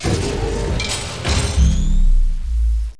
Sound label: spawn boss